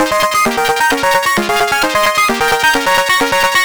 Eb, acidized synth loops, 131bpm; Zero-G; Soundclash Nu Dance Electro

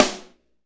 snare R B, Roland snares; Northstar - Drumscapes Roland

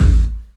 LEDRMK, 3D Drums, LESLIE KICK

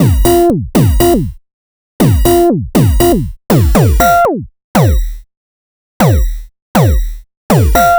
Beat, Modular Electronica

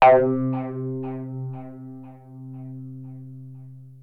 Access Virus B; Synthworx

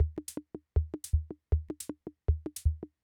Loop, rhythm king; classic machines

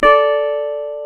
GTR, Dobros, National

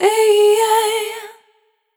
Multi-Voice Loops, Vocal Samples; Extended Family; Sonic Foundry (Sony Creative Software) - Pocket Diva World Class Vocal Samples

